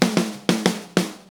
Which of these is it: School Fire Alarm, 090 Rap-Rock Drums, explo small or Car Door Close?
090 Rap-Rock Drums